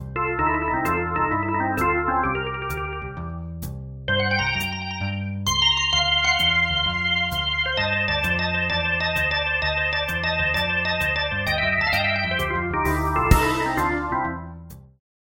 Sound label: Blues Organ; Organux; Syntheway